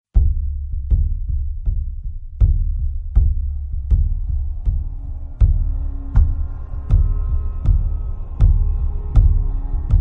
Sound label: scary african tone ringtone; African-Ringtones